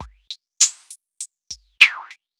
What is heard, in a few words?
filter groove, Drum loops